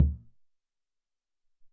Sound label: Surdo, Alesis SR-18